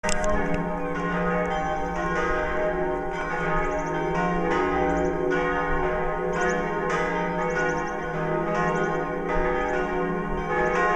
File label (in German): Glocken von Mariae Himmelfahrt